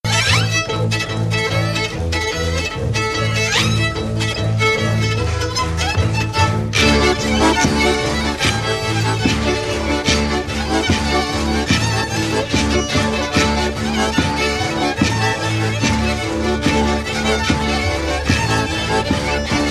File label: polka; lietuva; ringtones lituanos